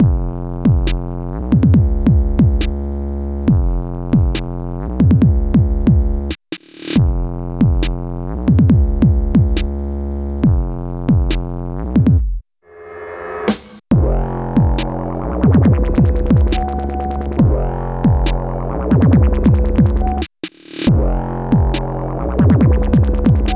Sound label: shade (No Vocals)